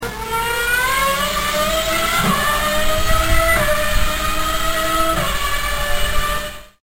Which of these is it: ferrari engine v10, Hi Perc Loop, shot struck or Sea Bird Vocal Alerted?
ferrari engine v10